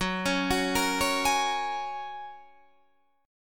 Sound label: Gb Major Add 9th, down, Guitar, Standard; arpeggiated; JGuitar